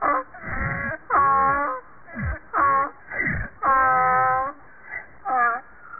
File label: donkey